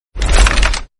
scope open sound sms message tone

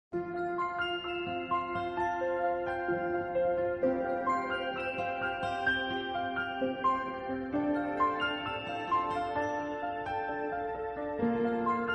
samsung j2 mobile tone

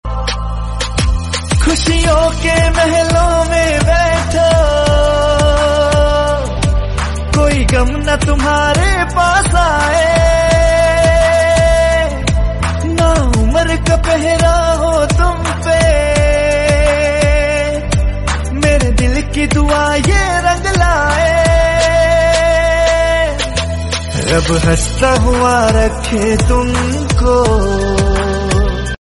hindi song

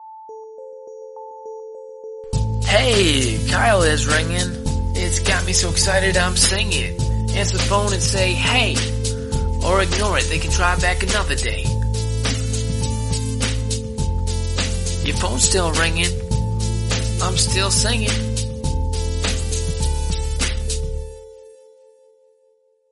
rap

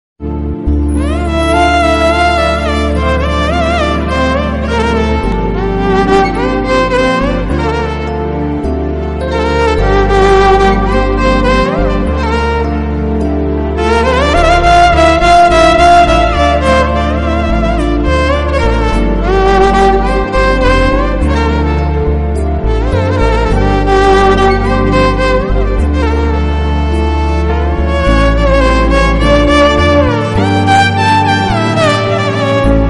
bgm; alaipayuthey

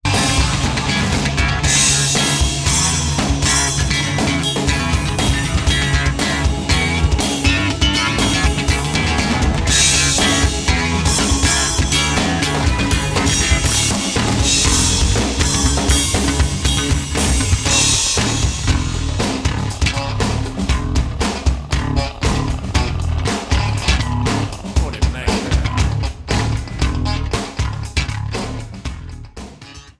funky bass guitar

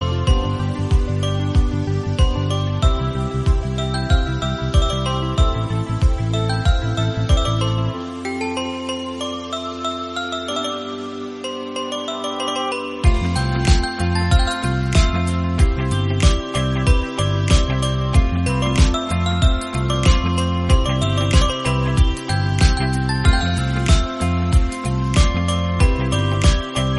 song instrumental; brahmastra

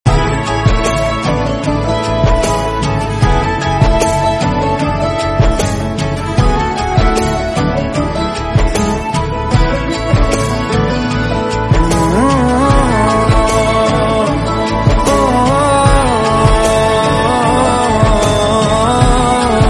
lofi slowed reverb

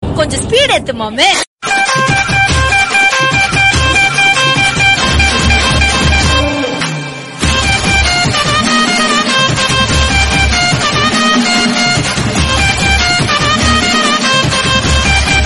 tamil bgm

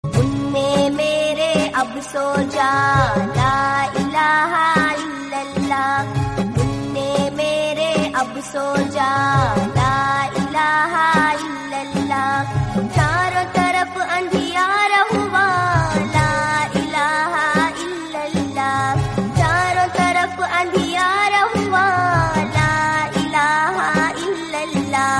islamic song

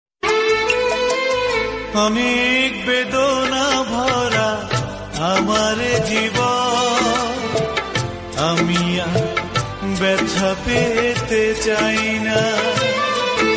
sad bangla

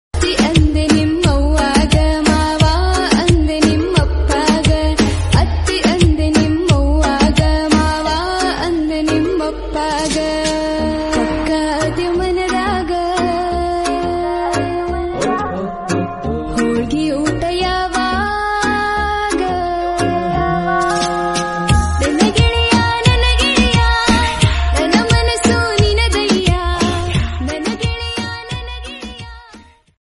kannada new trending songs

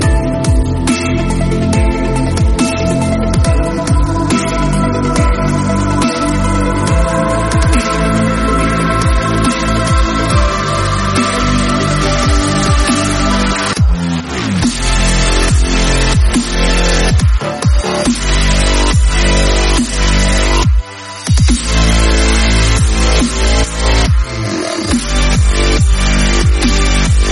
epic battle theme remix bgm; One Piece

